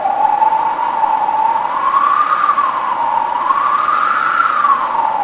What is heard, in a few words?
blizzard